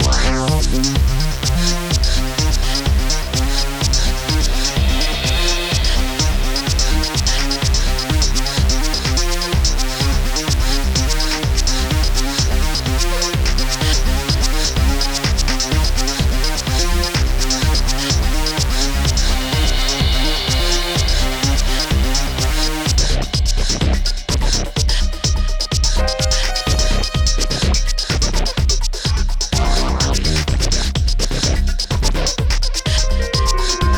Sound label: interrogation slow; Selenon Rising